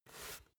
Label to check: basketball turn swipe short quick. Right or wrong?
Right